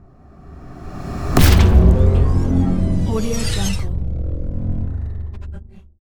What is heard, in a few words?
sinematic neon designed leviathan; soundmorph